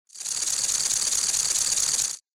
Cash counter

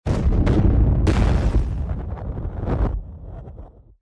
Explosion

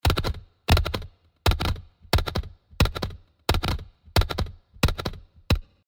horse stiff

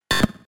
UI invalid sound

